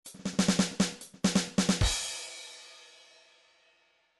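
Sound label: trance midi loops fills fast snare; Newtronic Trance Constuction Kit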